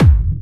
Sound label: Medium, kicks